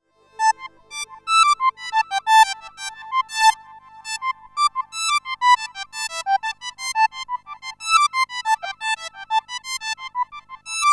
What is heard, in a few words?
Synthex solo, synthesizer